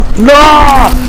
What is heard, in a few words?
nyaaah